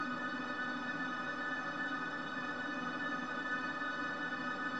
creepy background pad 100bpm; synth; instruments; loops

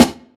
boom acoustic snare d sharp key; snare drum samples

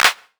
clean clap one shot f sharp key; percussion; single hits one shots

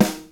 old school phat steel snare drum sound g key

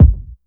subtle reverb kick drum sound d key; Duration 0:01

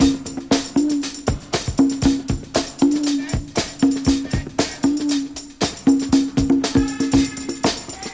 118 bpm fresh drum beat c sharp key

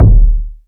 verby steel kick drum g sharp key

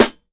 clean acoustic snare g sharp key; snare drum samples

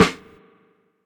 nice steel snare drum f key

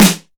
focused steel snare drum sound F sharp key

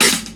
dark tone open high hat sound c key

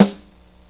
reverb snare sound g key

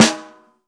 big room snare drum a key

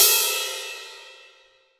long drum crash sound A Key; cymbal drum samples

